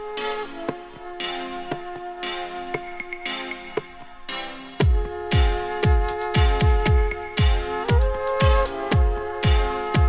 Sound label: war flute